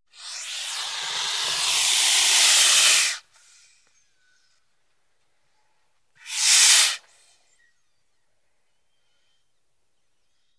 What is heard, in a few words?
Boa, Albino Hisses, Multiple. Close Perspective.